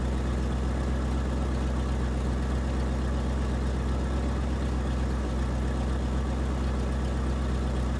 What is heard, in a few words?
vehicle suv idle; teardown ps5 windows xbox series xs gamerip 2022